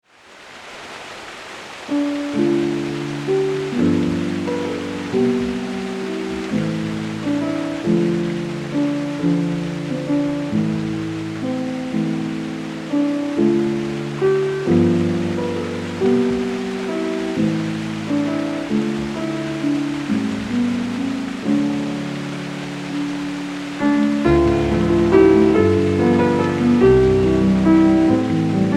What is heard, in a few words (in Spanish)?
Música de Elevador, Musica de Piano